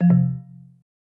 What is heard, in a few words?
Incorrect